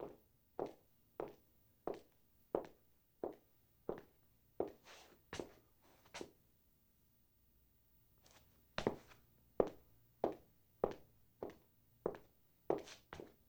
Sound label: Footsteps Linoleum Slow; Impacts; 1001 Sound Effects